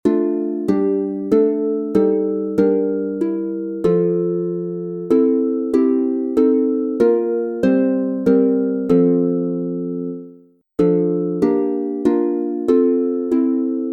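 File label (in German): F Dur 3 stimmig instrumental, Harfe; Messgesaenge; Agnus dei Christe du Lamm Gottes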